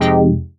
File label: stab warp, stabs